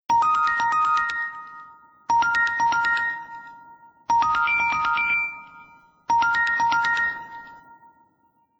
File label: New Text Message